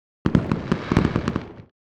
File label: Fireworks Delight; TransitionsFX; НАБОР DSLR КИНЕМАТОГРАФИСТА